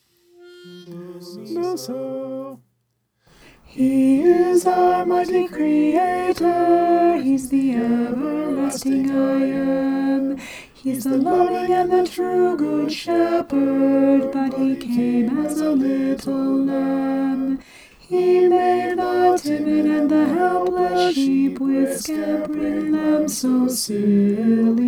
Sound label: he came as a little lamb alto